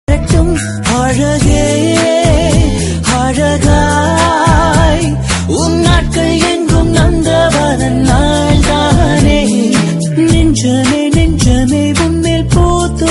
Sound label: Happy Birthday Song Telugu Song